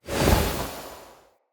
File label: Staff Caster Cast Long, Weapons; Player; Staves; Galene